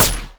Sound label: suppressed smg; Weapons; EK modern; Barotrauma